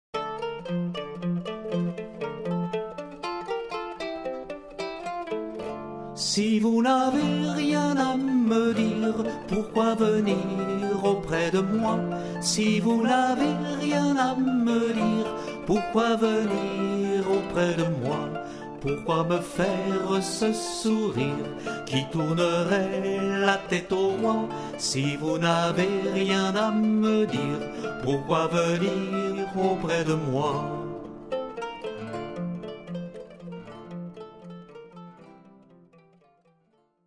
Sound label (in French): chanson